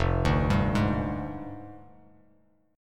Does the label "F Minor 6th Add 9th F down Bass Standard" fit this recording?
Yes